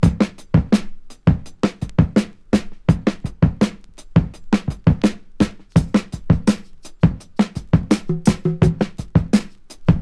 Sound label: 84 bpm modern drum beat A key